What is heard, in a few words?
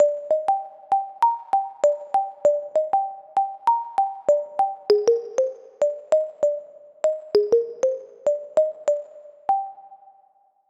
Melody Lead Dancehall Afrobeats; midi wizard